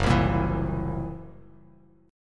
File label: Fsharp 7th Flat 5th down Bass Standard; strummed; JGuitar